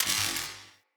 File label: Shock Projectile Zap